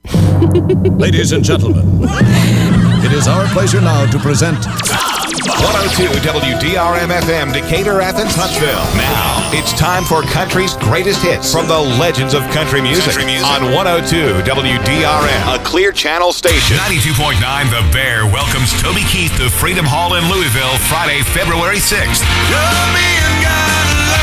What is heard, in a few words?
Moog Country